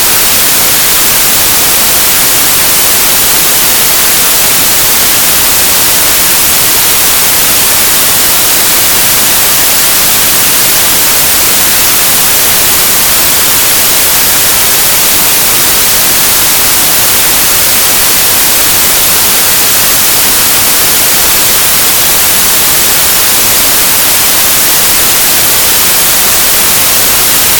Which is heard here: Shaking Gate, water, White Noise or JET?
White Noise